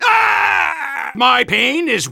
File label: soldier pain severe